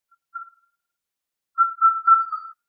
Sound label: Indian cuckoo